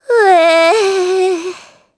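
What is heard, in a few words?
Vox Dead Japanese; Requina; kingsraid audio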